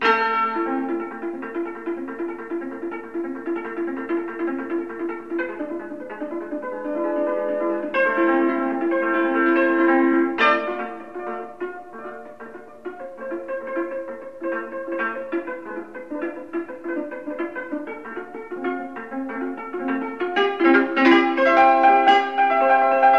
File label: improvisation on hungarian tunes, cymbalistes